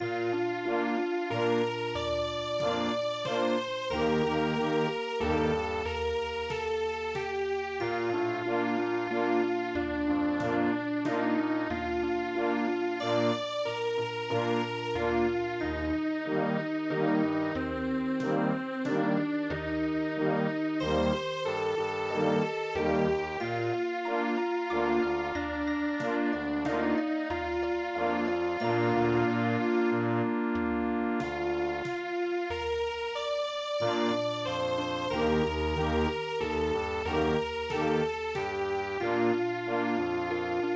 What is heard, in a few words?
waltz